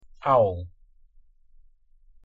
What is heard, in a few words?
owl; harrypotter